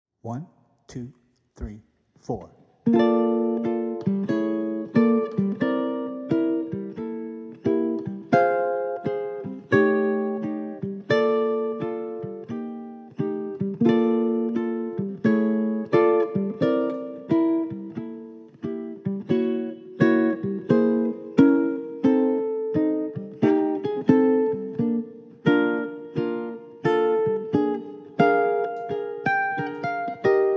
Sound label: uke